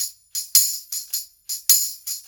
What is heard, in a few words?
Blues Perc Loop; Drum Tools